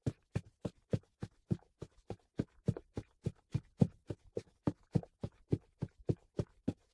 Run indoor; LOOPERS

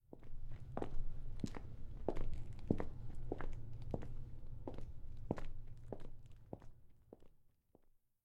Walk On Linoleum; LOOPERS

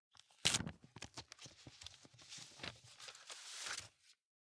Open An Envelope; LOOPERS

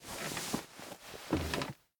Sit On A Bench; LOOPERS

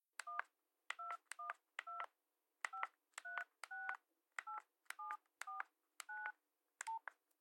Push Phone; LOOPERS

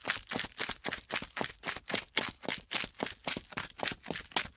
Footsteps Loafers; LOOPERS

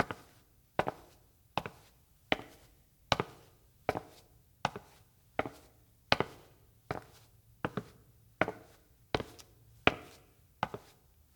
Walk Hard Floor; LOOPERS

